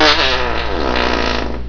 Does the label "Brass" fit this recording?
No